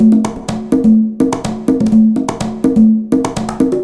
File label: Congas; конга